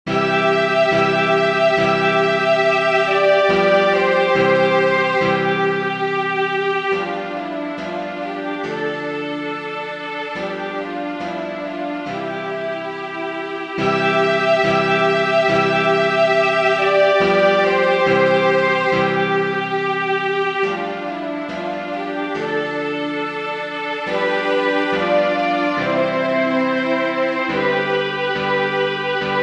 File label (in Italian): Inno nazionale croato; Lijepa Nasa